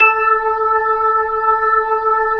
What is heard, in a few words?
B-3, Jazz